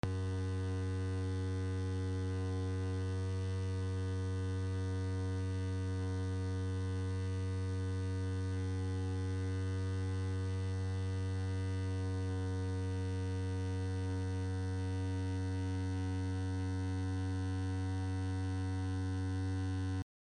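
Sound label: electricity noise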